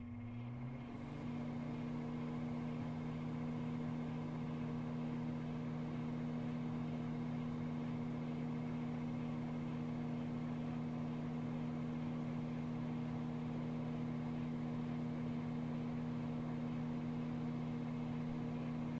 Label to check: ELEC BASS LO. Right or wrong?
Wrong